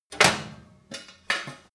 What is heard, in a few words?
griddle put down; berufe